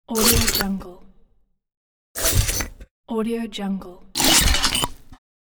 epic power up potion cork pop

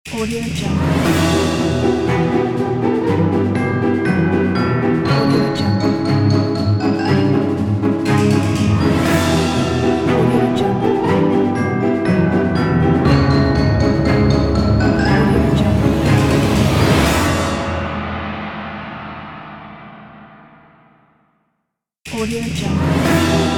halloween fun spooky logo ident

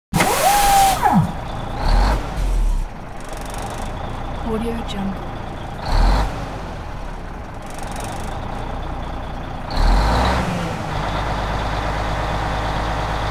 moving truck starts up and switches off; hollywoodedge

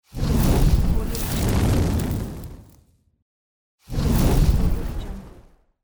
fire

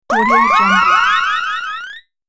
boingy cartoon alien effect

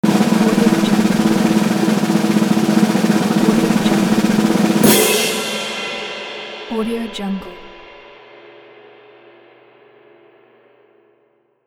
circus drum roll

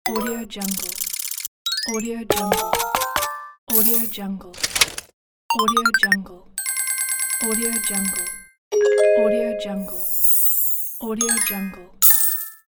slot machine sound pack

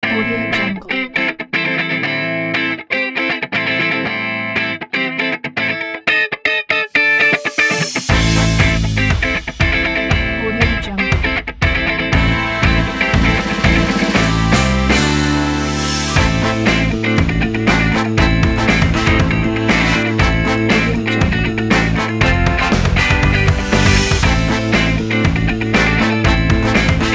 upbeat optimistic funky groove

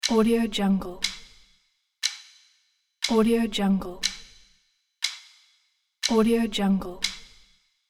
clock ticking

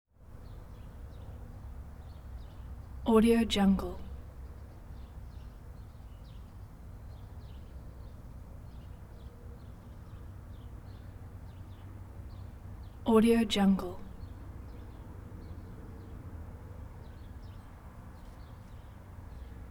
san juan bautista mission birds tweet chirp

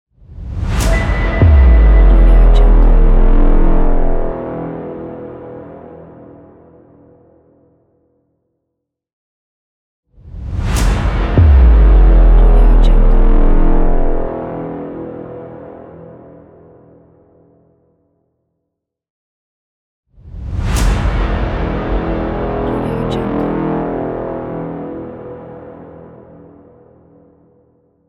trailer hit

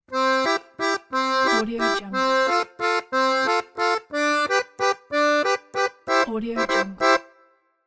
cartoon happy accordion rhythm

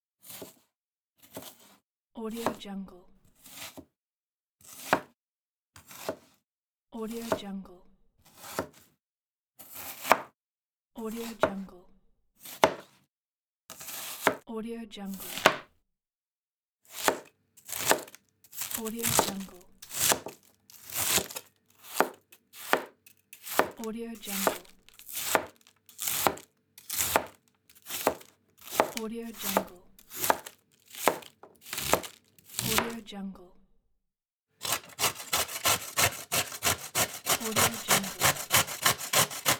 kitchen

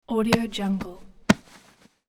grabs with cloth movement

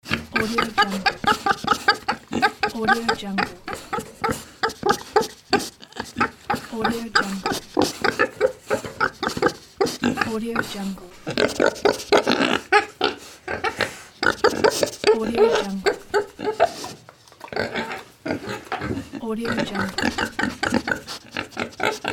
funny pigs

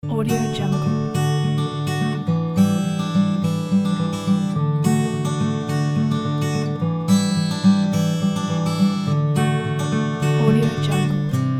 single acoustic guitar

